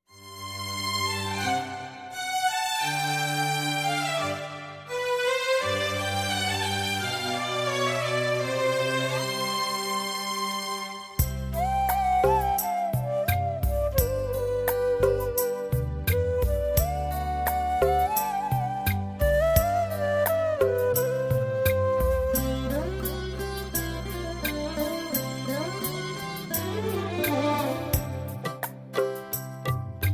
X'an Devotional Karaoke

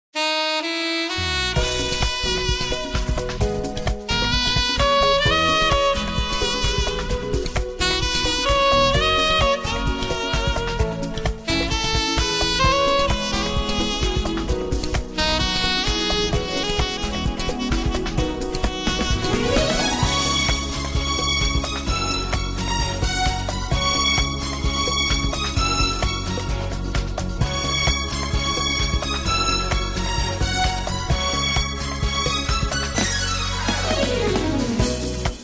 X'an Devotional Karaoke

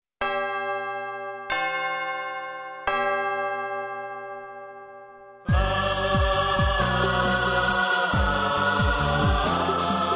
X'an Devotional Karaoke

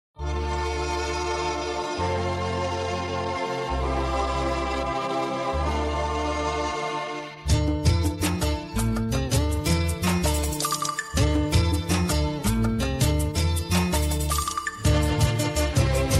X'an Devotional Karaoke

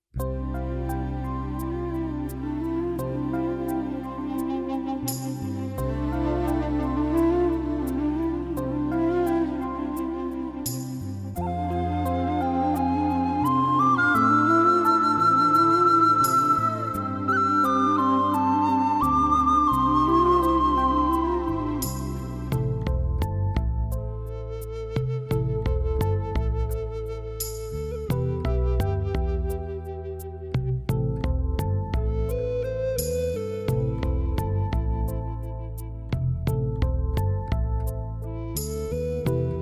X'an Devotional Karaoke

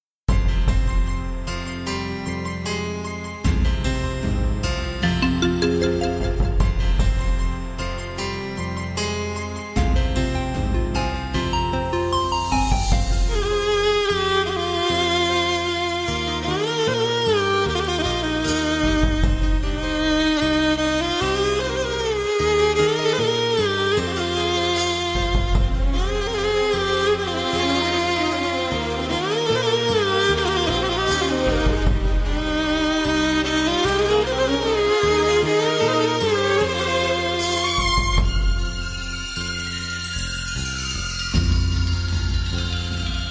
Christian Devotional Karaoke

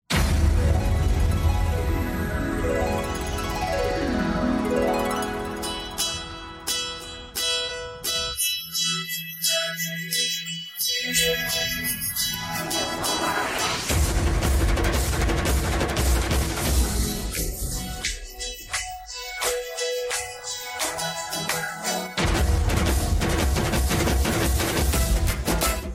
Music Vocal Removed Karaoke

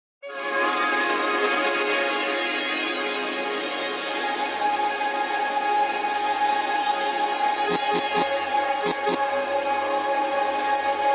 Vocal Removed Karaoke

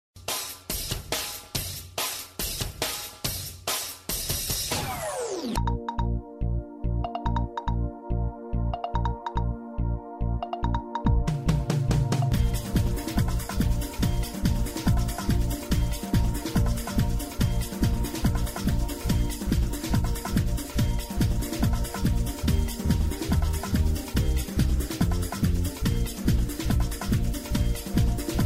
Rock N Roll Karaoke

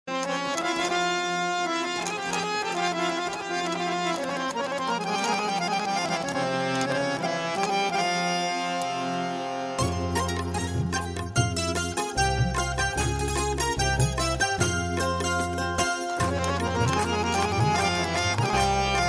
Musilm Devotional Karaoke

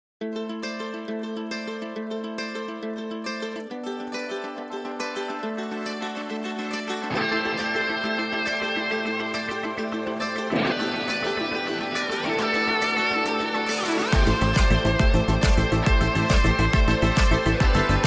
Vocal Removed Karaoke

